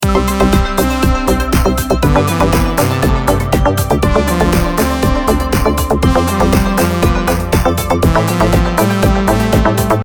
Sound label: Ringtone